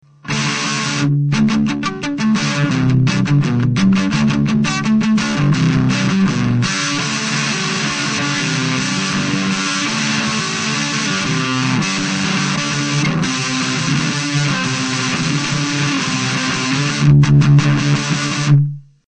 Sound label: FUZZ FACTORY CHORD, Smooth Velcro Chord